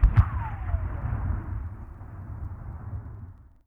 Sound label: distant gun; WAR